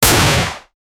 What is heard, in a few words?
fire from burst pistol; firearms